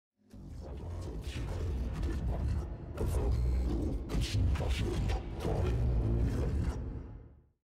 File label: cave; Minecraft; liminal-industries